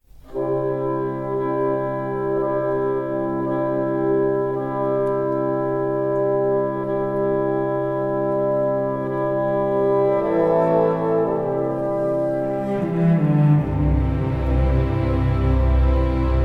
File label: piano